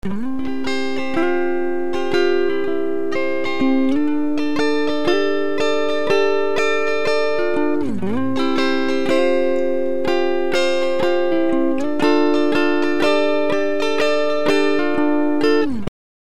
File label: Pitch Shift whole step, Raised whole step; Up whole step